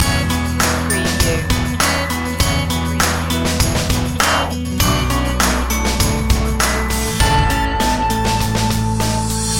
positive flow loop

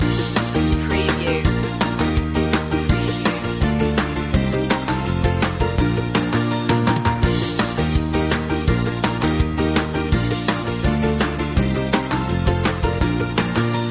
kids in the sun loop

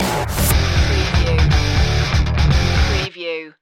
heavy metal showdown stinger

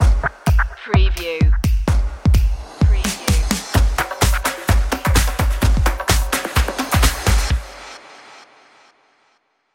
action energy drums stinger

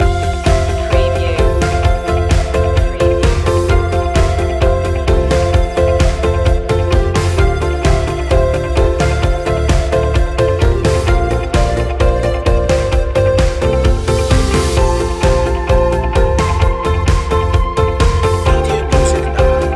corporate awakening loop

